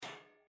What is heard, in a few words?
bird; starwars; episode vii the force awakens